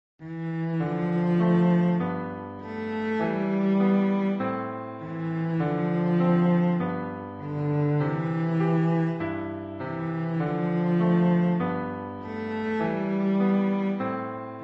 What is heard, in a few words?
stepping cello